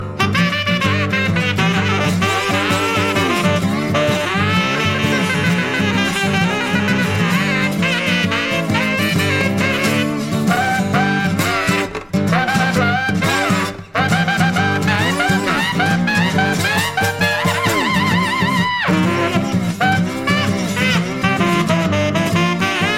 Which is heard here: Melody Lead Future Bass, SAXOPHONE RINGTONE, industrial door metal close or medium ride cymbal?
SAXOPHONE RINGTONE